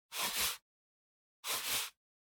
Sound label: PC Mouse Move Soft Pad Short